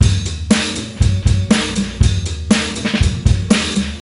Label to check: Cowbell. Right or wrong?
Right